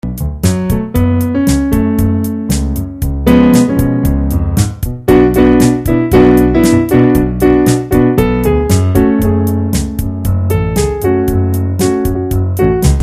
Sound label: piano; piano F#m